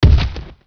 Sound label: boulder; Heretic II Demo